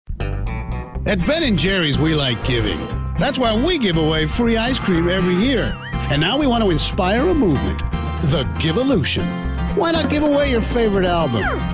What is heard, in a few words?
energetic; Ben Jerrys